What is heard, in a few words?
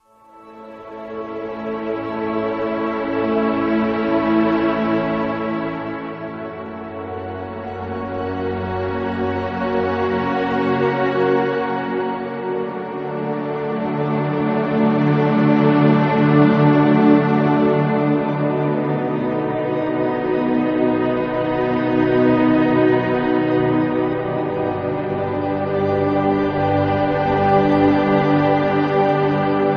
Stellar Pad; OMS Sound